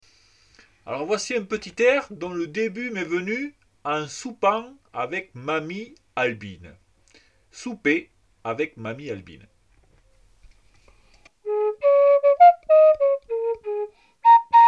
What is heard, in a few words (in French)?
flûte; souper avec mamie albine